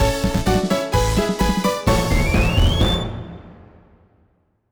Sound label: Jingle Extra Life